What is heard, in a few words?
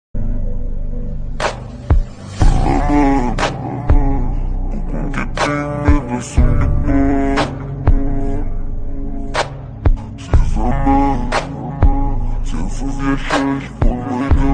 Sad Tone